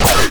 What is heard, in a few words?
grenade; rumble skin03